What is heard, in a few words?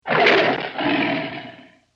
Tiger Vintage Roar; Big Cats (Sound Effects:Animals)